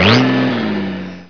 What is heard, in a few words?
sand bike move; Emperor - Battle for Dune